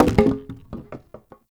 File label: Wood Boarded Wall Medium Destroy Chunk; Objects; Destructible Barriers